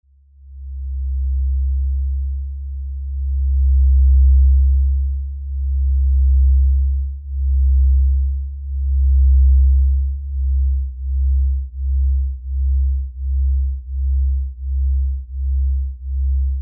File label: Diatonic High Wall Set Fundamental